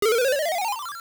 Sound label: Sword Charge; Charge up a Sword